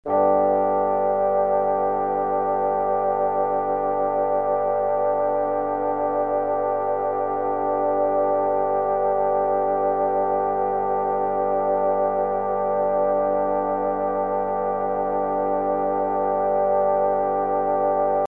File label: g major chord